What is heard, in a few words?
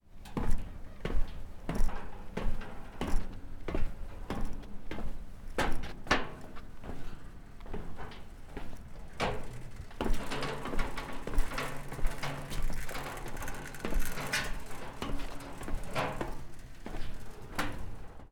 Steps On Metal Stairs